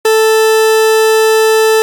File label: Subsynth wave